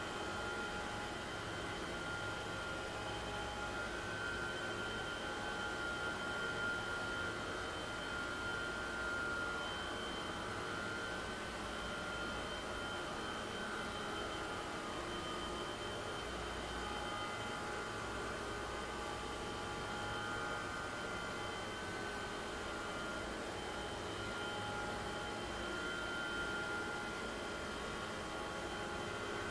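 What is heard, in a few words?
ringing thick harmonious sound of factory work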